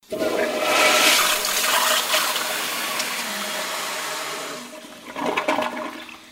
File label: toilet